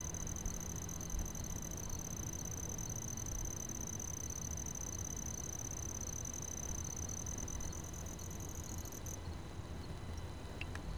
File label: Cricket; fieldrecordings